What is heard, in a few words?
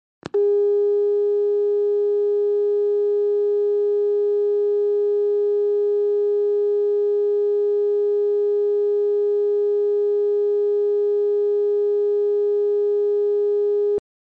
Phone hang-up beep sound